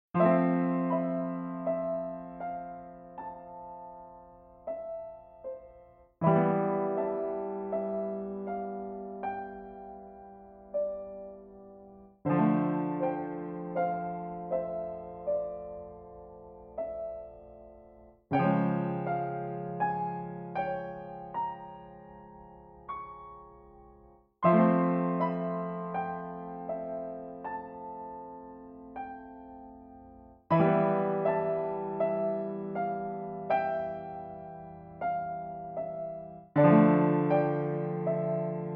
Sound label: Piano